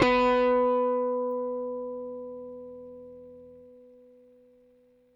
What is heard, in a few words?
Rickenbacker Bass; Airwindows